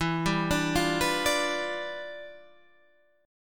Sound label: E Minor 7th Flat 9th E